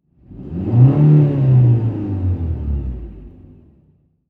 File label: Transportation Cars Honda Civic Rev Single Rev Engine Short